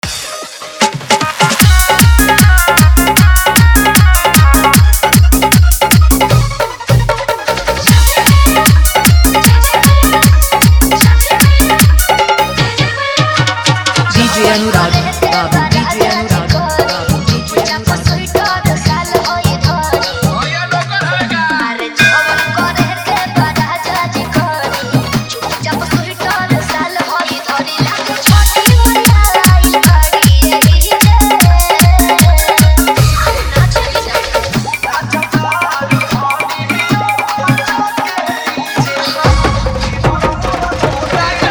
Dhollki Bass Dance Mix

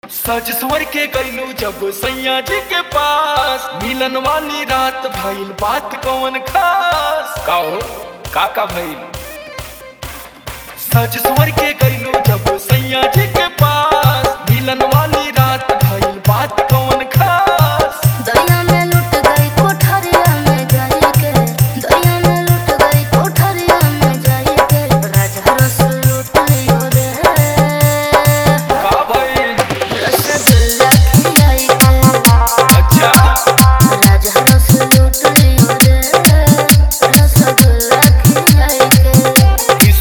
New Bhojpuri Full Dhollki Bass Remix

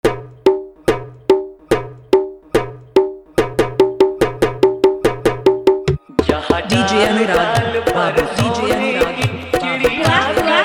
Desh Bhakti Song JhanJhanBass

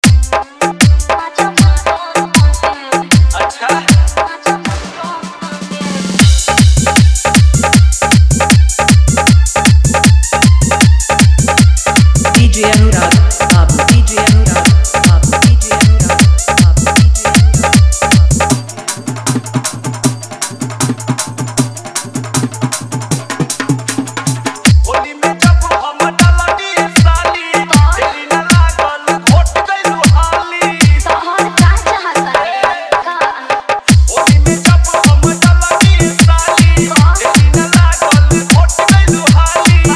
Full Dhollki Bass Mix; Holi

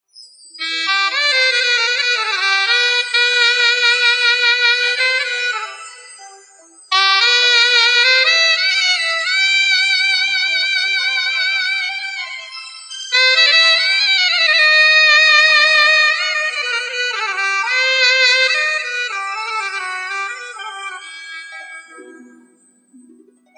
Bhakti Jhankar Dhollki Bass Mix

